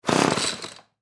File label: Chainsaw 35 Cc 18 Inch Pull String Putter Stall No Start; Saws; Industry; Blastwave